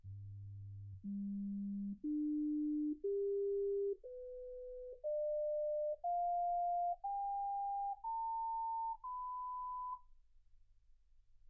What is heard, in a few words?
sinus tones 48kHz